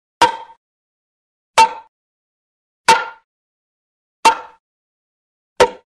Bat Hits Medium Single Medium Bat Hit With Dull Impa; Hollywood Edge Foley Sound Library